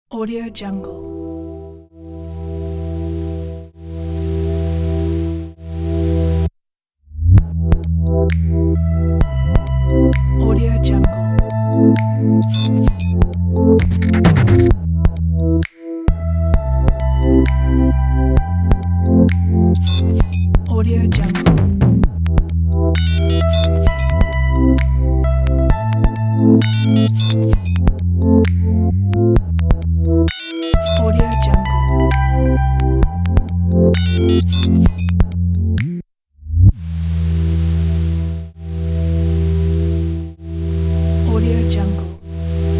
abstract glitch corporate

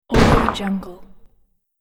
world builder stone hit

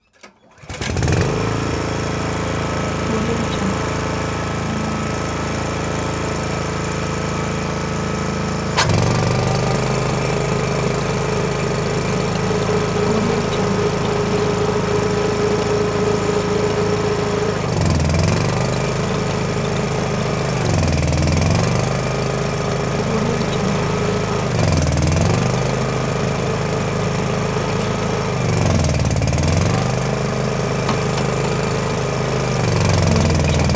trencher operate; prosoundfx

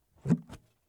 cover on off for bullet shell cleaner

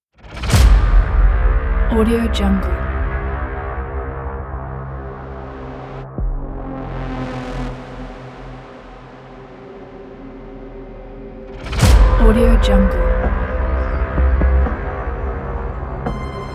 dark trailer

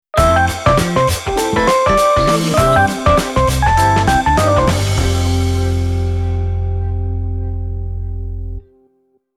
modern jazzy logo

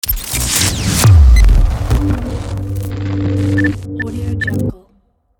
glitch logo; sfxroom

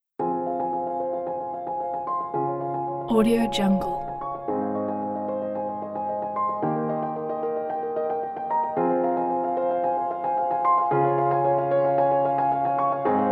epic

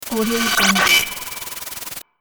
static glitch technology

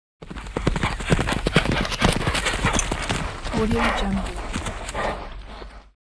two horses at full gallop on turf; hollywoodedge

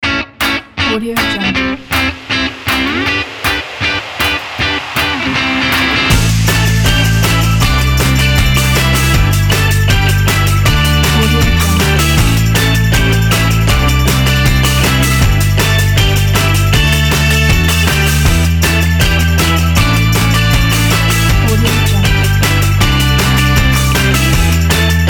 bright catchy corporate pop tune

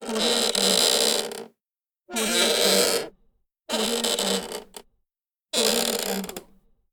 the wood creak

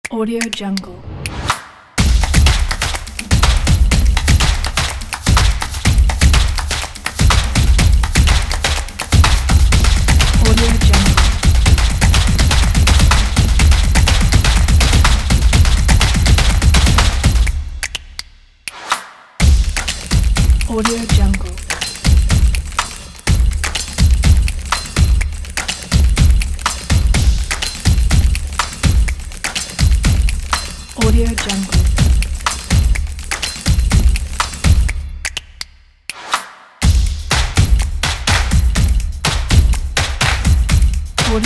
drum

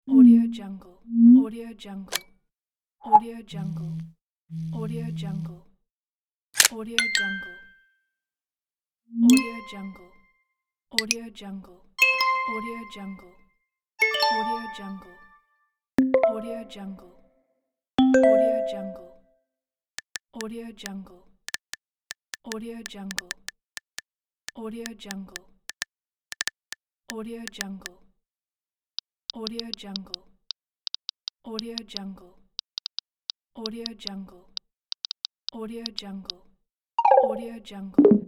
smartphone sound pack

